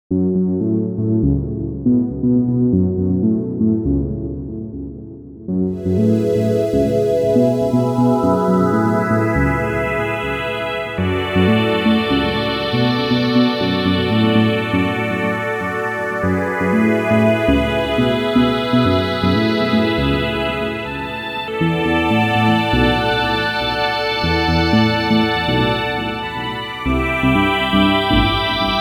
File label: OB E Split BassSweeper